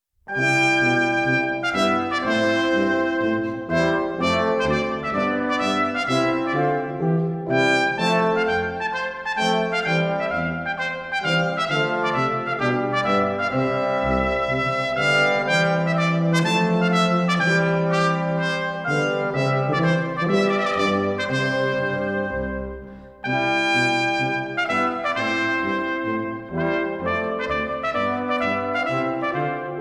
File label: trumpet voluntary, brass bands; stanley